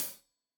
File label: Hat Tight, Cymbals Stereo Hi-Hat; Hat Tight 1; Deeply Sampled Drums